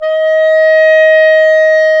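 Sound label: wind; Roland - LA Composer vol. 3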